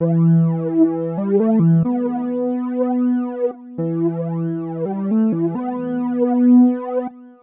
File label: flanger